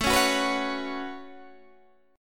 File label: A 6th Add 9th A down Guitar Standard; A6add9; strummed